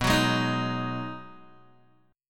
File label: B 6th B down Guitar Open G